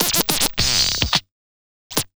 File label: Turntables